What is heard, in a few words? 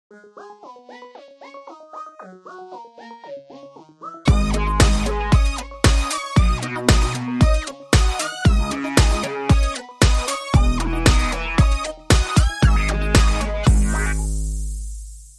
Stay In The Lead, Sting